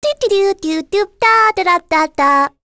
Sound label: Vox Hum; kings raid; voices heroes en